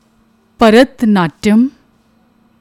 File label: Bharat Natyam, Indian classical dances